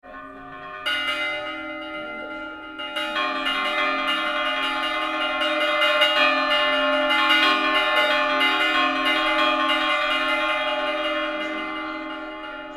Repique Borben

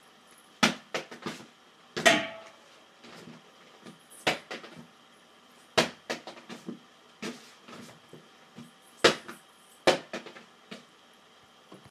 GOLPEO BALON

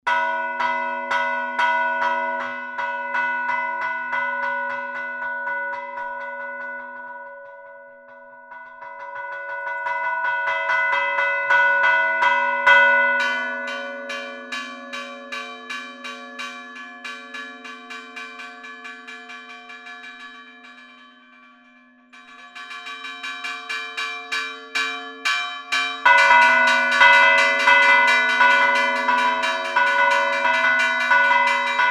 Repique Taboadelo; Tascam

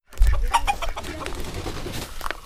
gallo tarde

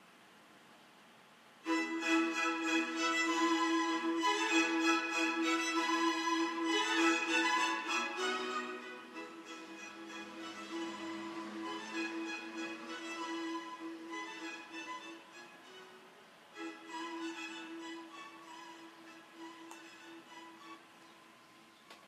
Musica clasica

